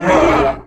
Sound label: Hit